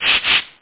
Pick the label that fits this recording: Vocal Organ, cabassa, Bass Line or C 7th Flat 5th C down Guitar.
cabassa